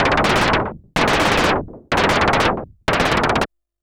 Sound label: SH101 Clocked LFO Rhythm (125BPM)